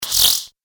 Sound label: Arcade Game Laser Forcefield Beam; Retro Inspired Sound Effects - Think Nintendo, Atari, C64, Etc.; Andrew Fleming